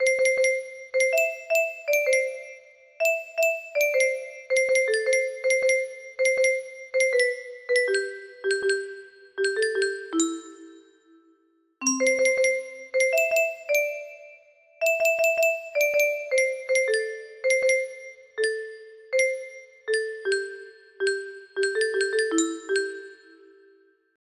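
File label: music box melody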